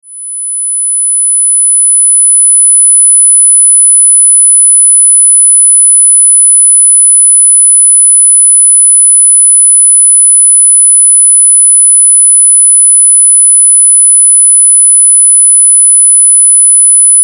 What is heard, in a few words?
10 KHZ TONE